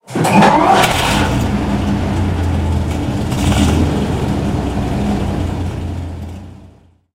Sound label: panzer3 engine start, tanks; War Thunder